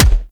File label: Weapon Punch Rifle; Warframe; Weapons; Rifles